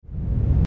mind detox ambient soundscape

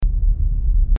Heavy Bass Brown Noise with Descending Bass Tones; speaker headphone subwoofer burn in

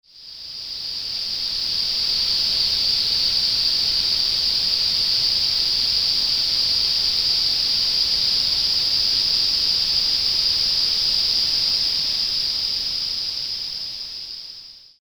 Tinnitus Shifter 4900 Hz Noise Masking

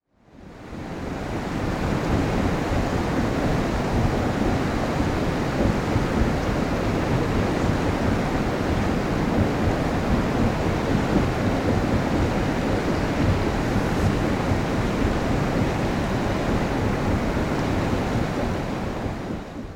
Steam Sounds from the Volcanic Caldera; ancient caldera steam noise

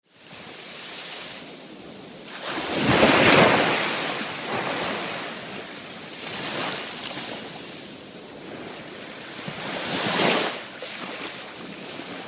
Waves of the Mediterranean Sea; nature sounds ambient listening

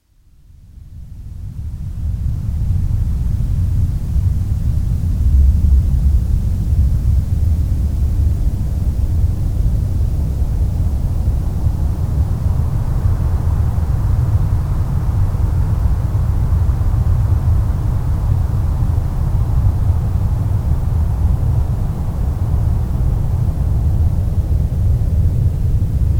moon deep space ambient

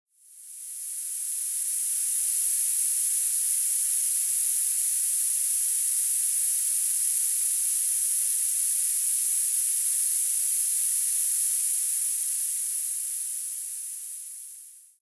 13 kHz Noise Masking; Tinnitus; Tinnitus Zapper

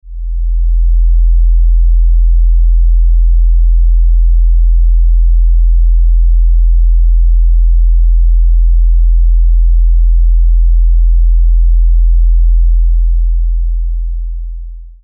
42hz sine wave; pure sine wave

